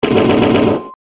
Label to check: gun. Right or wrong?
Right